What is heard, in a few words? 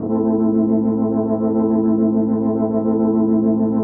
DEEPHOUSE ORGAN 125 A